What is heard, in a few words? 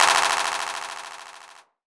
CLAP FX